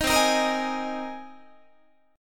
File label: C Minor 7th Sharp 5th C Guitar; Cm7#5 strummed; JGuitar